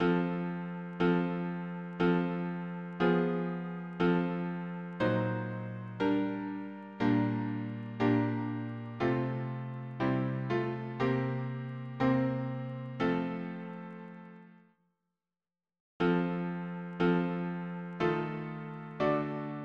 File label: harmony piano